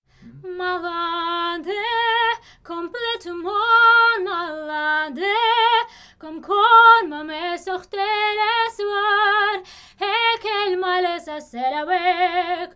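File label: domra, Neumann TLM170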